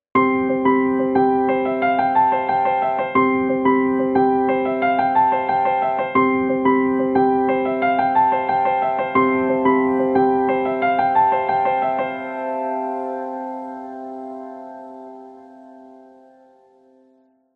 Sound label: Alarms; Innjoo i1